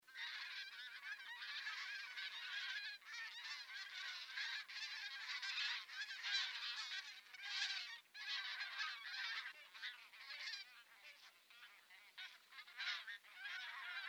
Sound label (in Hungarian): vetesi lud hangja